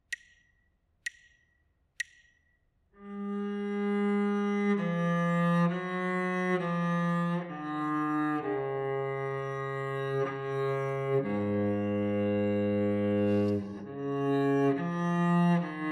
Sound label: partially completed melodic dictation; ear training